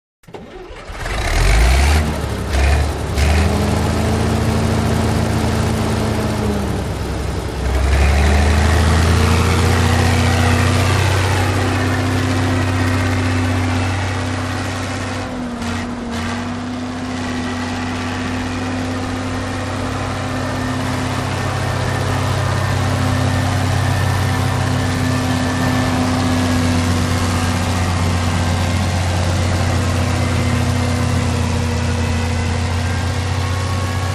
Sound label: Bulldozer; Bulldozer Start Up And Work. Close To Mic At First, Then Moves Off To Long Shot, Then Comes Back To Mic, Stops, Idles And Switch Off.